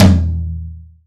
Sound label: long tom sound e key, Royality free tom sound tuned to the E note. Loudest frequency: 746Hz; tom drum samples